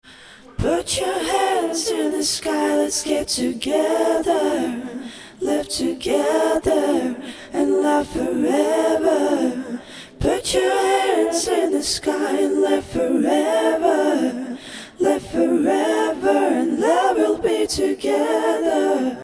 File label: Vocals chorus